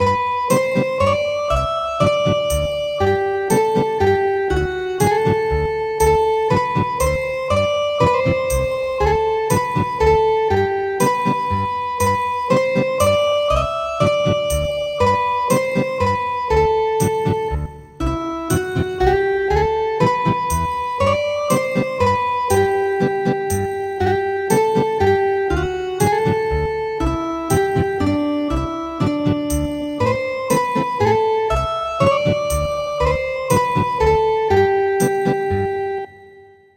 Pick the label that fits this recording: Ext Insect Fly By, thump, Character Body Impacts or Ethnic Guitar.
Ethnic Guitar